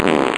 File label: tiny fart